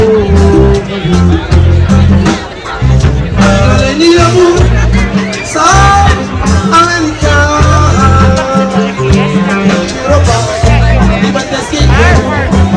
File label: reggae; HTC Wildfire